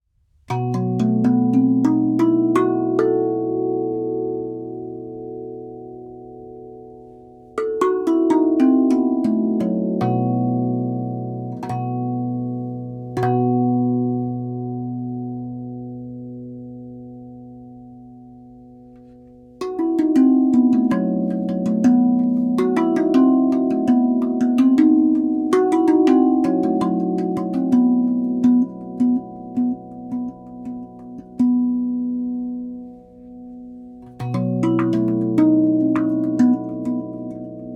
Hijaz B, rav vast hijaz si b

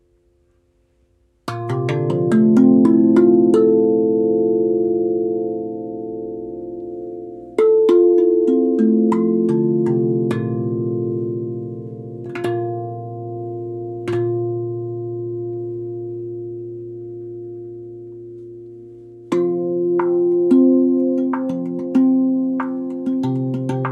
Dm F, rav vast; rav vast ariumi fa re mineur

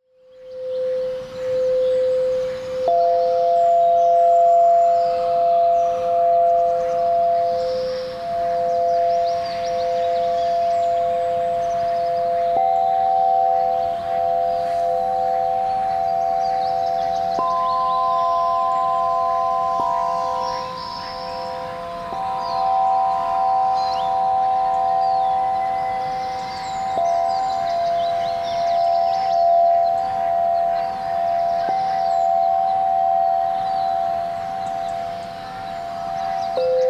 Chakra C, harpe de cristal; harpe de cristal chakra do 8 tubes

